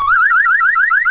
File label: car alarm